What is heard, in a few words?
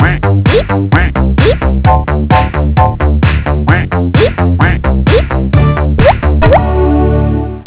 Funky Mac